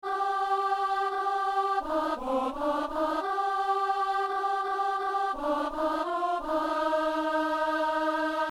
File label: alto; Psaume 118 (119); Messe du 17 janvier à Saint-Denis